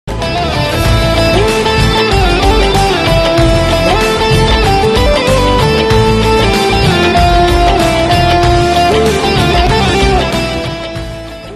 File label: instrumental ringtone